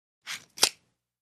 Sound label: Safety Belt Click, seat-belts; accessories